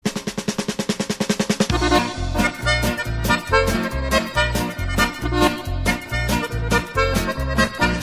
polka

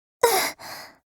scared breathing

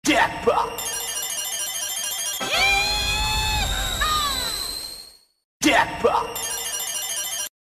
yiiiiihaaaa

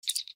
communication chirp; the ratioing

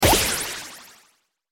bubble burst swoosh

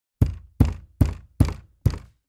pounding on wall

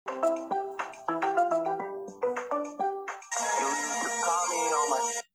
jumpscare; meme rat dance

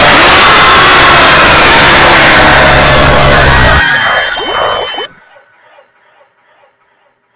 jumpscare

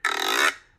guiro

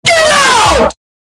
get out scream

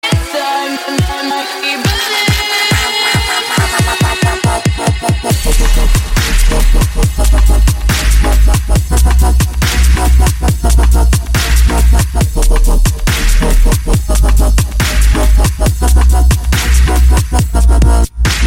dubstep remix; foamo

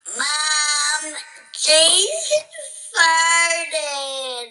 farted

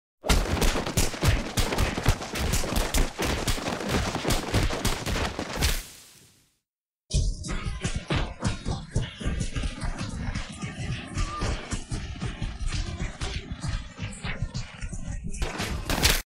boom box

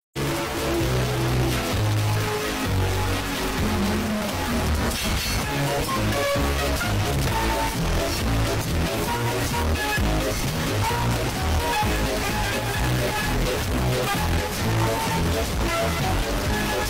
bass

